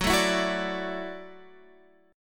F sharp Minor 7th Sharp 5th F sharp, Guitar Standard; strummed; JGuitar